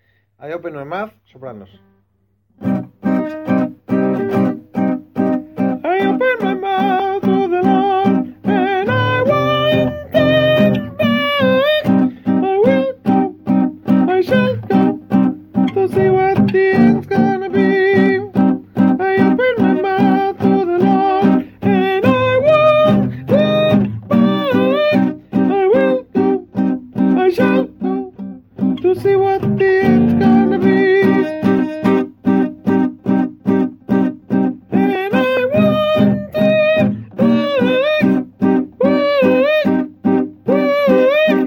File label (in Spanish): voz soprano; I open my mouth